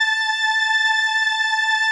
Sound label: 505, String Machines